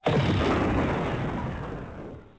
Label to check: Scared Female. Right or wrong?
Wrong